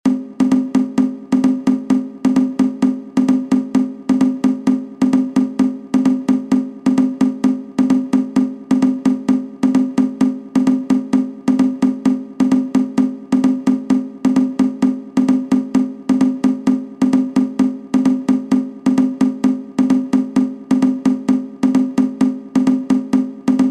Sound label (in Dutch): Percussion